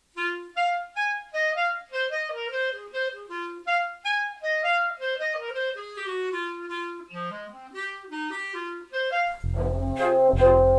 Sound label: clarinet thing orchestration